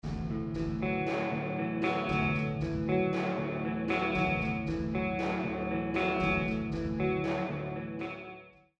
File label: Chorus